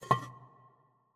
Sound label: Jar; Legend of Keepers